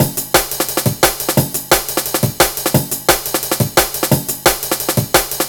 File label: classic dnb breaks, C, 175; Scotty